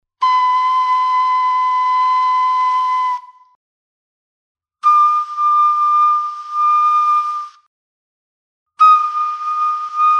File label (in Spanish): flauta globular